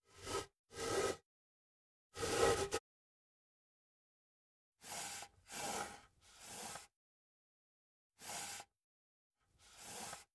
Rope Pulls; Fairlight Sound Lib

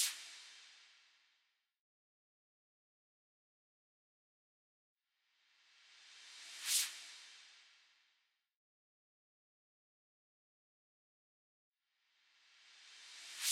Drum Loop - 142 BPM Impact, Drill; Loop Stems; Cymatics; PARADOX II - Drum Loop Collection; Yearbook

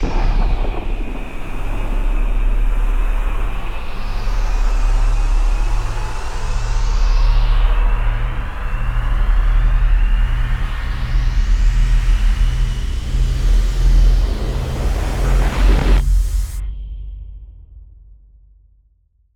Booster Sounds FX; Video Game; Galaxy Rush; Cymatics; Video Game Sample Pack Vol 1

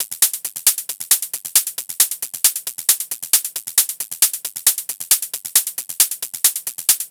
Hat Loop 135, Techno

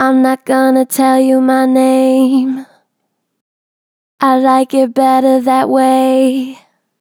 Natural Vibrato Gentle, Harmor Vocals

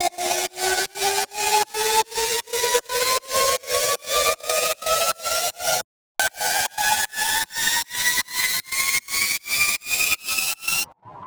riser oneshot, FX; GhostHack

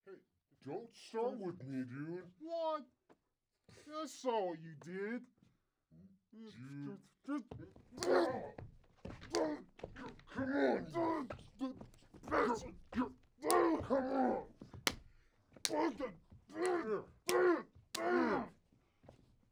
large brawl; Cartoon - film effects

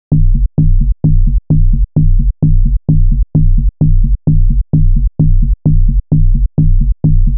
Bass Loop 130 B, Techno; Ghosthack; Free Techno Sounds 2024

